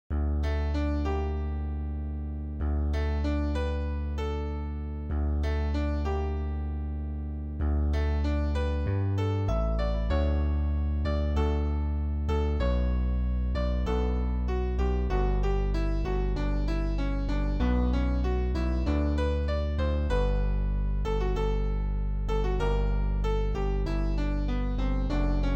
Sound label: Shout Chorus Sop